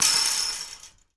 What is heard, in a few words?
Street Ball Metal Rim Chain, Street Basketball; SFX Kit